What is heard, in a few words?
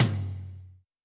hi tom drum one shot g key; tuned drums g key